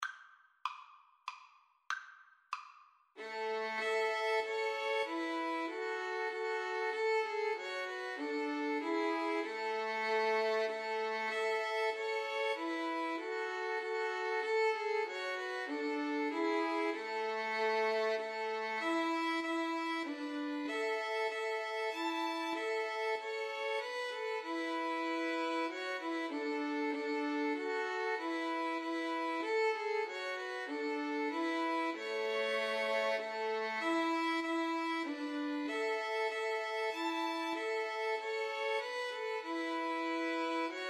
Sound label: Christmas Hymn Violin trio; Wyeth, John